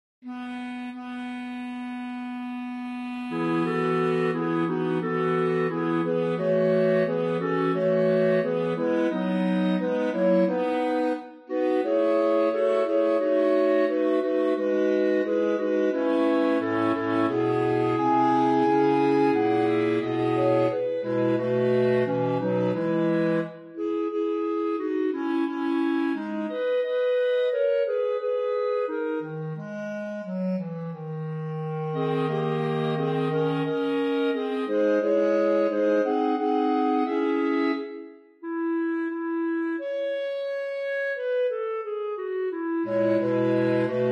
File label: SATB; Fleurs et les arbres